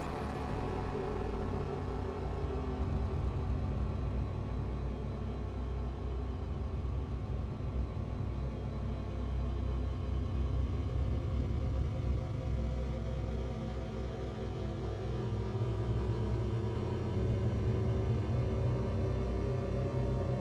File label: soundscape